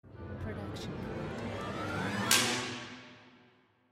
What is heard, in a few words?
clumsy orchestra